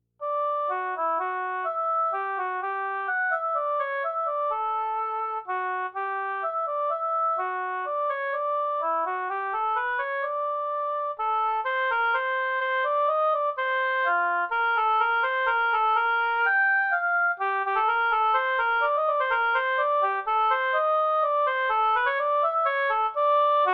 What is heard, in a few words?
Oboe Technical